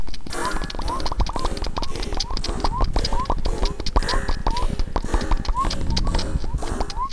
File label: poot